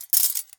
Silverware in Hand, Kitchen

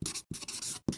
Write on Paper with Marker; Office Supplies

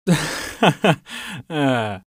Sarcastic Laugh Male; Vocals & Wallas; 1001 Sound Effects

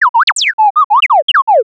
Little Droid

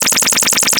Arcade Movement; Video Game Sounds; 1001 Sound Effects

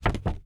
Cardboard Flutter; Office Supplies

